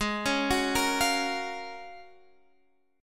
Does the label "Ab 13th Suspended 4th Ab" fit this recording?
Yes